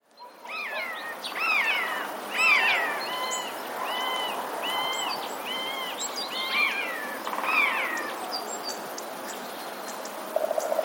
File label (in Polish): sojka; oczywiście głos podobny do myszołowa